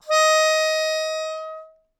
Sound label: Melodica Long, Long Notes Chromatic